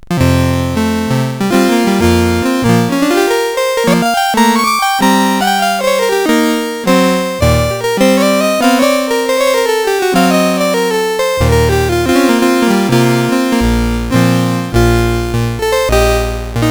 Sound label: Improv, CSG8580R5